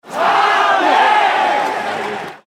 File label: Cheer Crowd Baseball Japan Chant; Crowd cheer at baseball game in Japan; Japanese crowd chant; Baseball Sound Effects